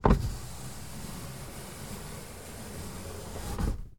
Car Ford Fusion Petrol 1.6 Interior Manual Window Wind Down; MKH416 CU; Submerged Tapes; Ford Fusion Plus Ambisonics